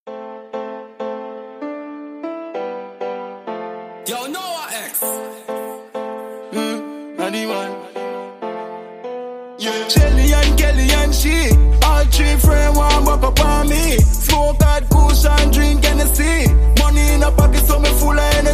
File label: trap house riddim